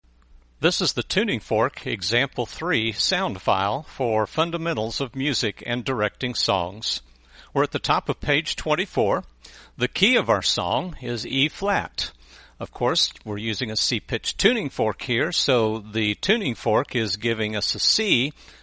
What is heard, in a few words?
Tuning Fork